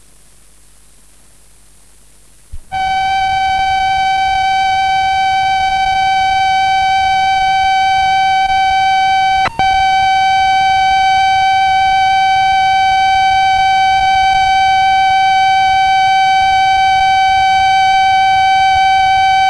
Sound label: Slots, cassettes; Creative Computing